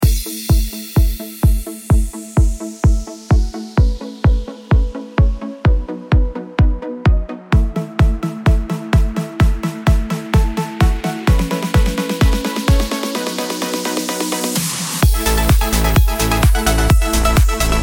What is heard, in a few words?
uplifting synth anthem